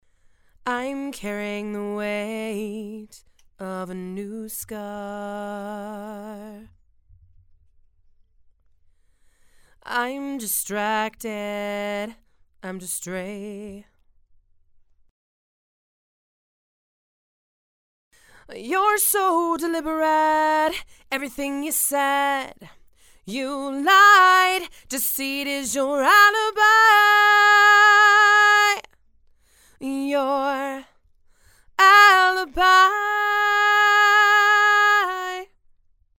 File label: Female Vocals